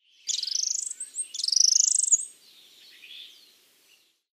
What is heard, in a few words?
Black saw wing; پرنده اره بال سیاه